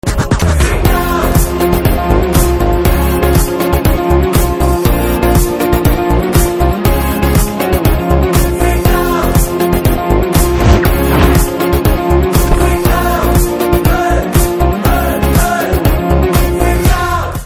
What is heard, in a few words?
Ringtone; Disco Raja